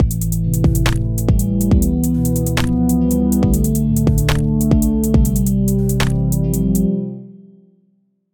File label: my beat new